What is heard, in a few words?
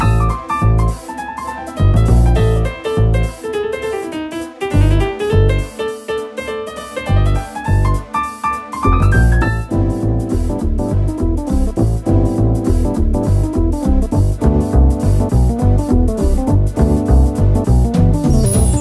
Palm Tree Groove; DKCR